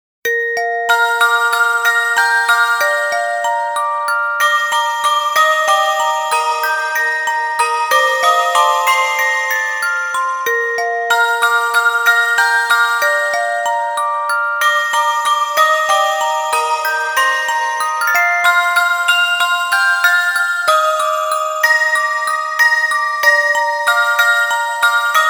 Orgel; Kira Kira Deardrops

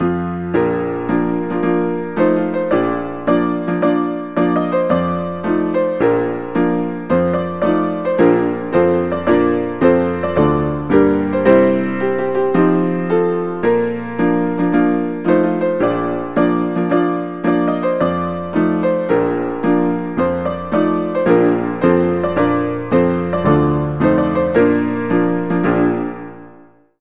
Triumphmarsch; Knigge Spielend zum guten Benehmen; NDS

